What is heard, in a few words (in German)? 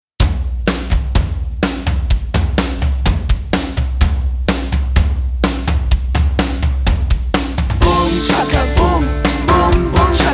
Ich spiele Schlagzeug